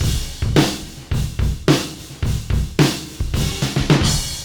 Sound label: Beat Loops; Zero-G; Monster Beats